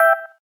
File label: doremi zero, keytone